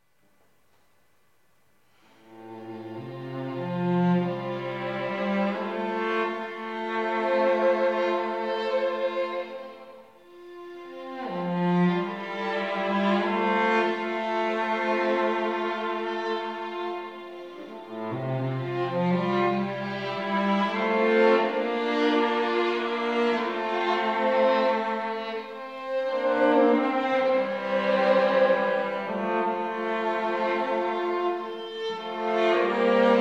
string quartet; classical musicians

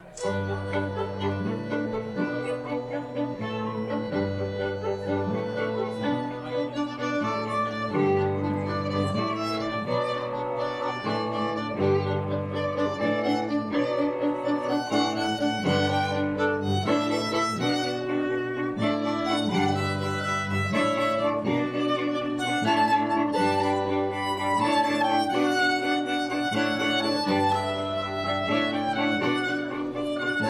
strings, live

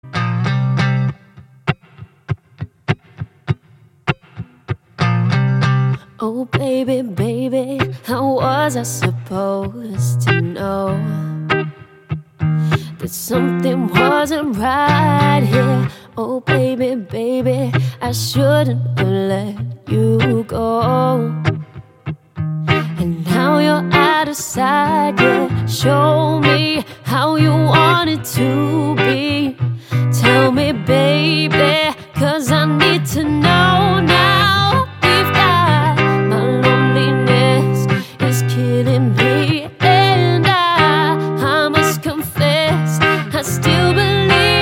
acoustic

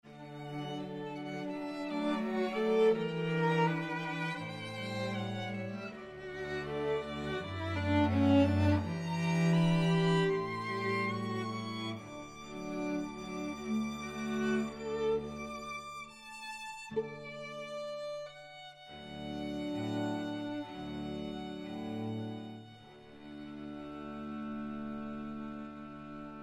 strings

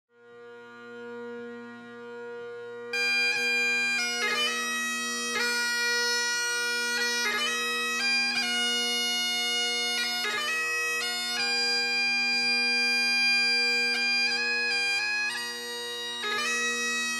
piper, Traditional Scottish Bagpiper; Glasgow

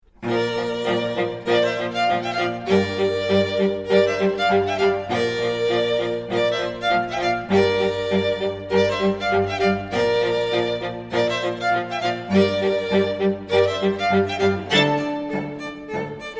strings

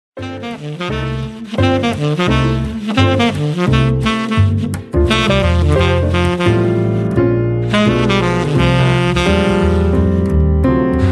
jazz, jazz swing bands

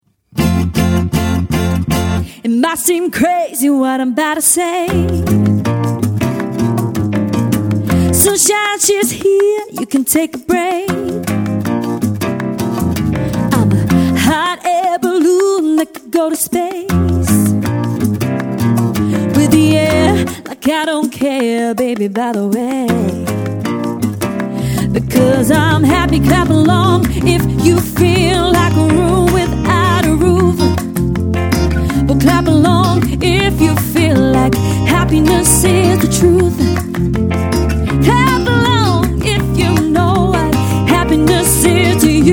acoustic

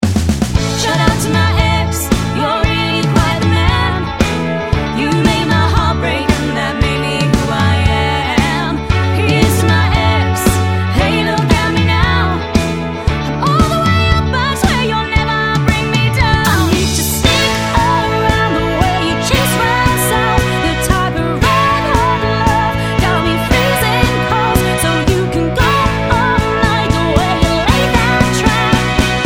pop mashup

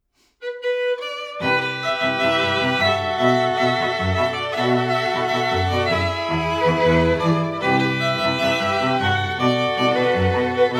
strings

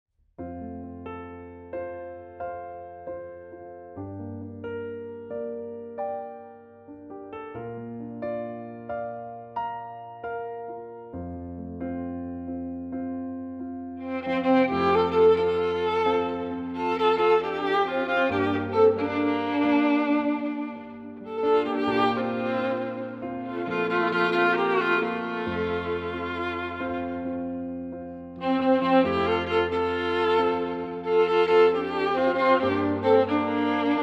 Electric & Acoustic String Duo; classical musicians

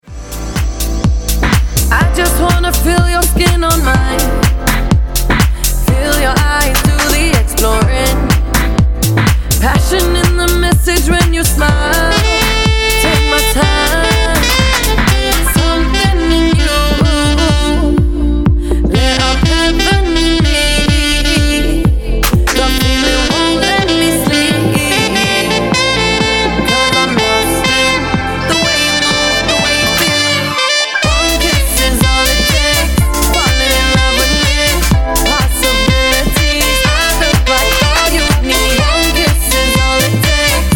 e sax

